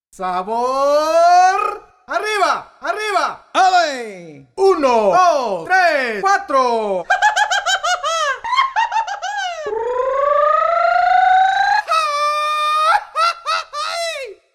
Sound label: Voice Menu; xp-80; roland; Voice Menu 1 patch demo; roland demo cd-3